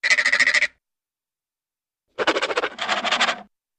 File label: Scratching Dog Wood